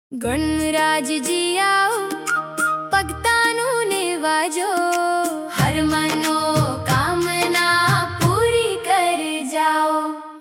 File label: punjabi ganaraj ji aao, ringtone; ganesh